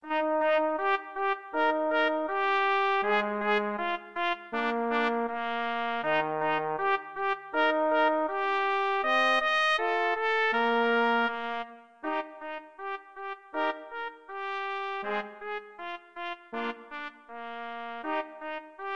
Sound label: 2 Trumpets Trombone; Haydn Surprise